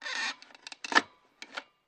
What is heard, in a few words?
Punching Holes Paper, paper punch